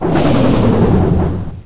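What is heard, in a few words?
door close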